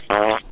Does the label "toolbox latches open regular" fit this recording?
No